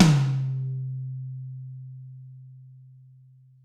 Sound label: large room tom drum c key